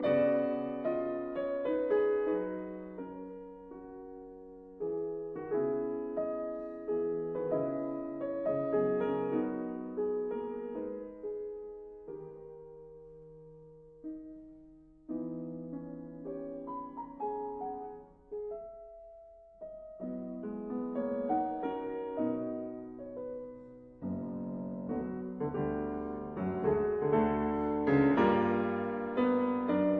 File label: Mazurkas in B major Allegretto; 9 Mazurkas Op 25 No 8